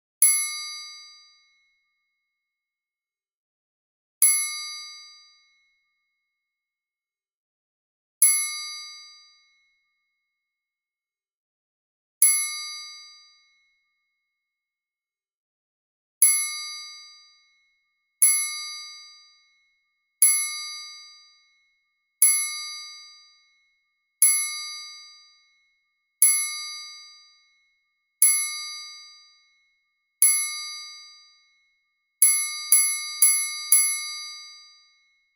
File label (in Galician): Triangulo